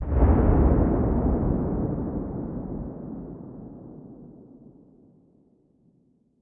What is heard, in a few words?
impacts; World of Tanks